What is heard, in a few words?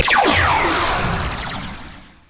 Sound alien beam in